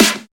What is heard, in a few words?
airy snare drum g sharp key, Loudest frequency: 2811Hz; snare drum one shots